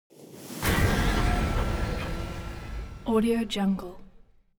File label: magic spell create aberration